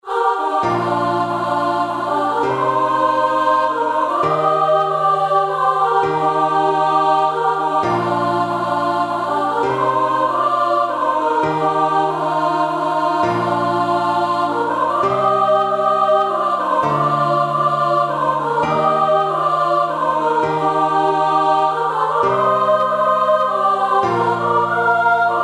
choir

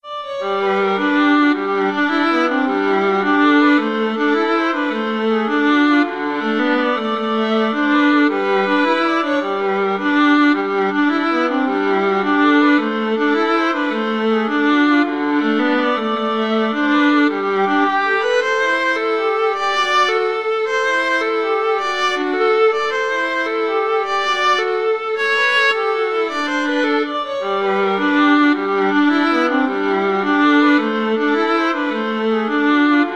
mexican hat dance, violin viola duet

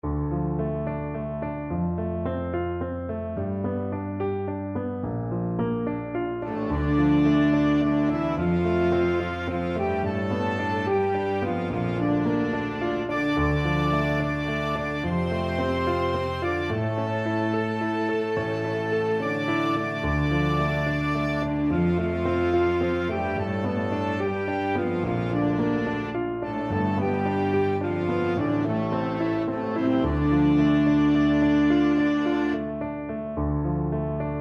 flexible unison

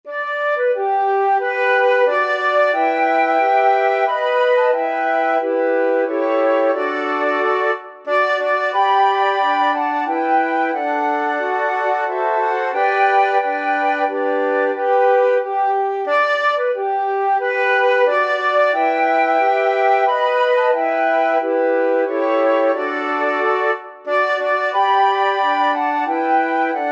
star spangled banner, flute quartet

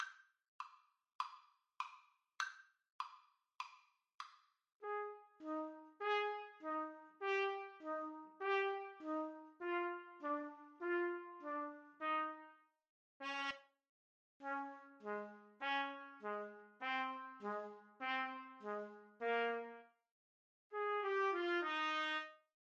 trumpet duet

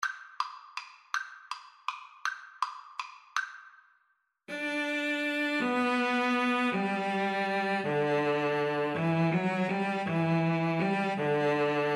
Cello Duet

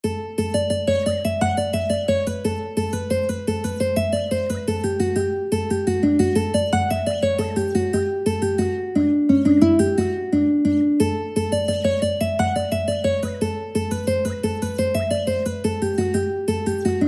Cuckoos Nest, ukulele

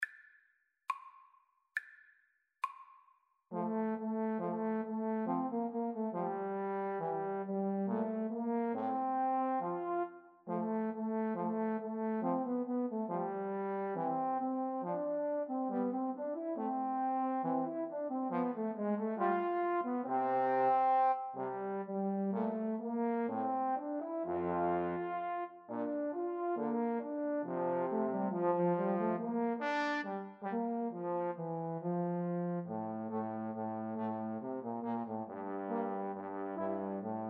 rose, Trombone Trio; schubert

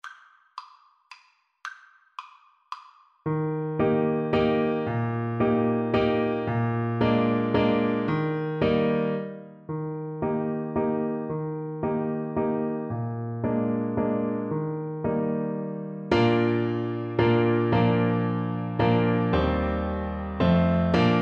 oranges and lemons, Trombone

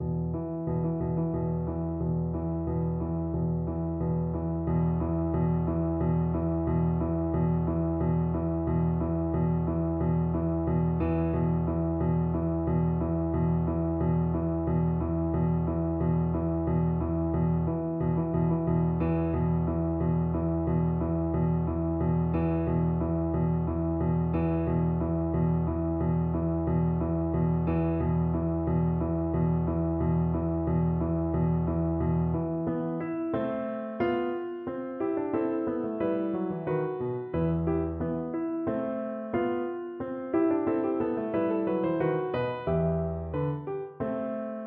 cello

